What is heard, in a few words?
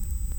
Hydrophone